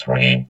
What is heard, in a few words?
VOX VOX, Electro Vox